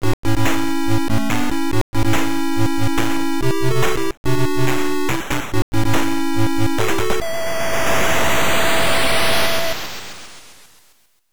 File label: Area Clear; Go Ahead; Aleste; MSX2; PSG